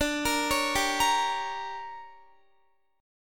D Major 7th Suspended 4th Sharp 5th, D, down, Guitar; arpeggiated; 4E,4A,5D,5G,5C,6F; chord